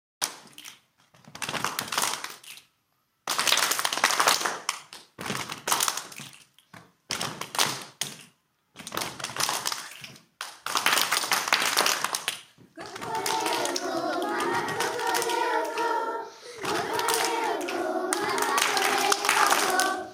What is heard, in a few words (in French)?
chanson et percussion